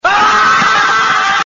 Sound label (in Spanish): grito, horns